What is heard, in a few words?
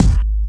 BD, Dance Mega Drums, BD HOU; Best Service